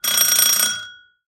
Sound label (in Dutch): deurbel